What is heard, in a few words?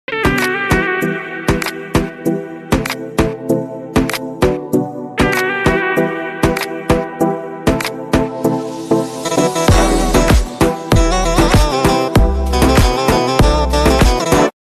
music dubsmash; iran